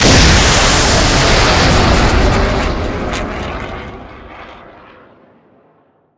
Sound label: Weapons Missile Launch; DCS